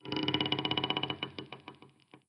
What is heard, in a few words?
wood creak wood creak